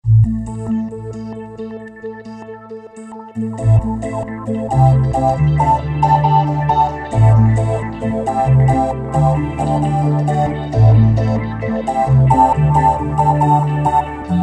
Trailer Pipe

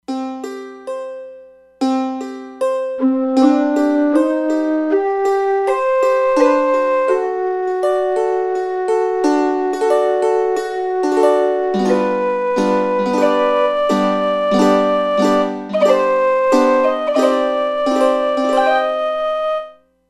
Celtic Split, E-mu Proteus-3; Factory patches

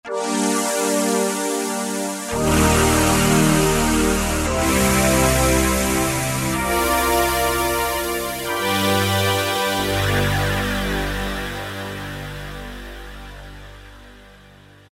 synth-Modestal; E-mu Orbit V2; Factory presets

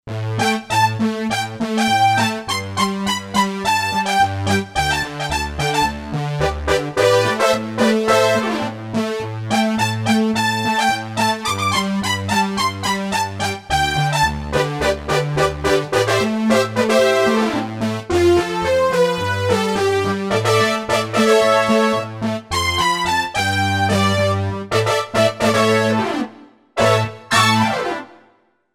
Poly Synth; Roland SC-880; Factory Patches

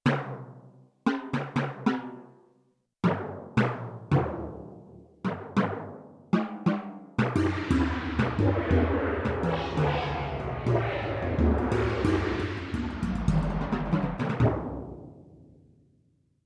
Delay Phase, Timpani & Gong

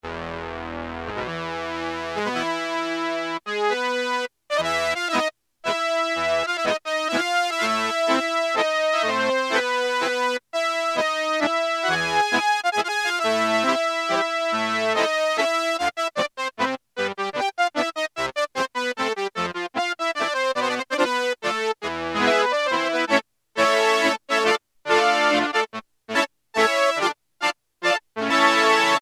Accordion, Roland JX-3P

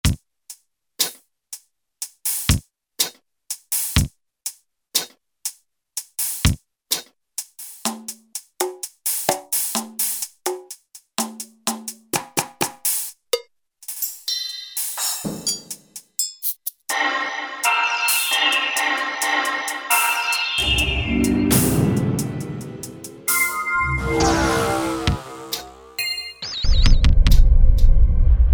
Sci-fi Set; Special FX; Roland SR-JV80-15 Special FX Collection

